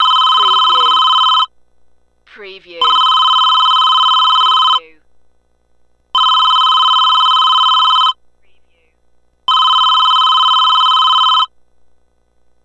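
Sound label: Telephone 4 Rings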